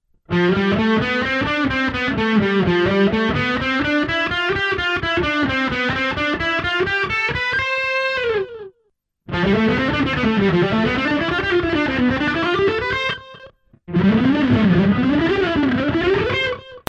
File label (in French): plan en do majeur depart fa